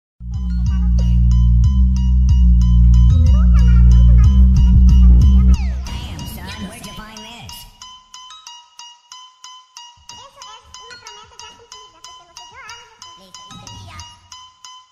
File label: Remix Bass Boosted